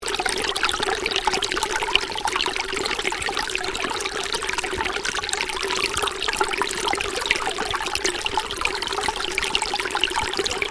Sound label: Stream: small stream running, loop; Water; Nature; Stuart Duffield